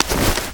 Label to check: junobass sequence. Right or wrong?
Wrong